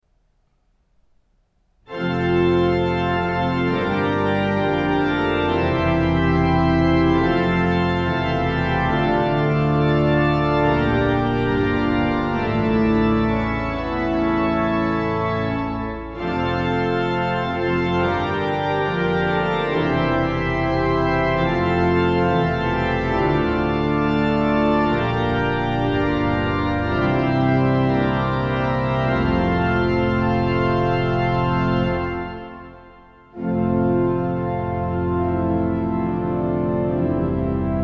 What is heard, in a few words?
Fanfare; Aalesund